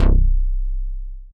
BOTTO, SYNTH BASS

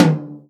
tom, Real Drum Kit, jungle kit

